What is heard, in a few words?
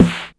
SD, Hard & Loud Techno; USB Soundscan vol.01; AKAI